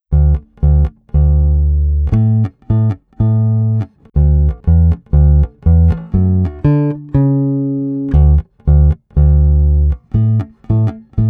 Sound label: BASS ONLY